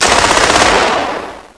gunshot

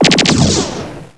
laser gun

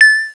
timer

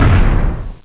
IMPACT

